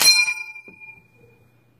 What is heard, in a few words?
empty gun M1 Garand Ping